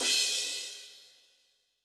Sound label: Ride, Cymbal; Yamaha DTXpress-II